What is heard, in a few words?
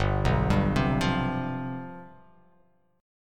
G 11th G, chord